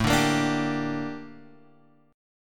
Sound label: Bb Major 13th A